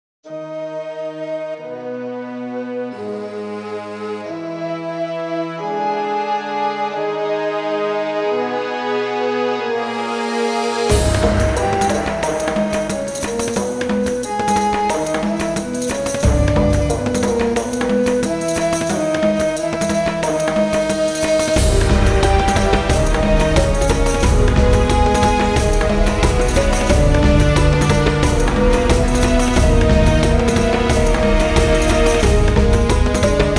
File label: Eastern Greek Battle Theme